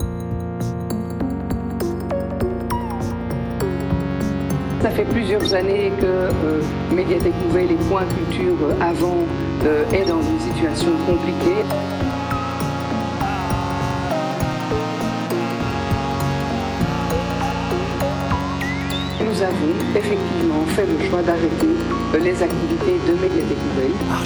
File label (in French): jingle radio; loposum; patate; la voix de la ministre